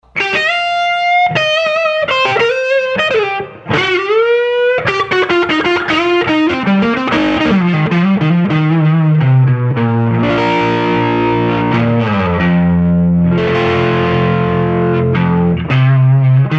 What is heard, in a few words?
Crunch; Herbbie 60 watts